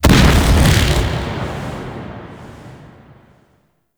artillery cannon fire; Enemies; Weapons; Warframe; Arachnoid Camper

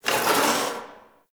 Box Of Trash Rustle

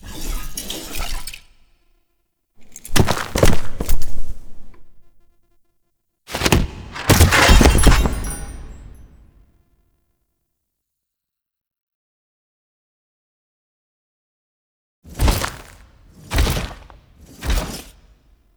Throne Room Foley; Cinematics

